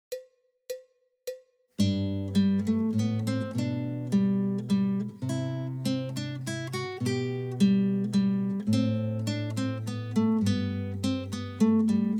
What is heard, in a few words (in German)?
Menuett, gitarre